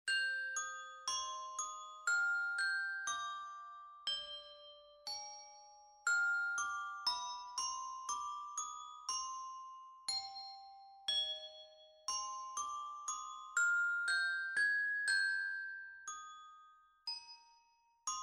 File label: carillon